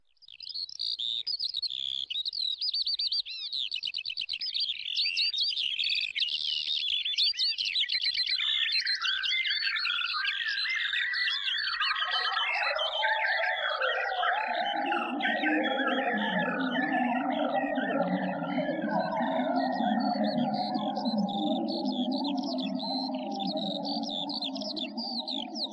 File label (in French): alouette, oiseaux; oiseaux extatiques